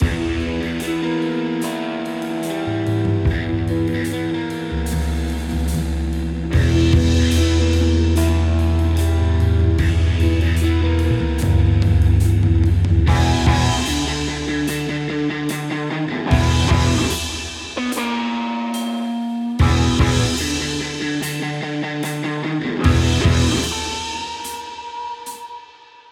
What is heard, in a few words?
Alternative 147bpm Drum Full Intro Outro; Hot Lava; Caldera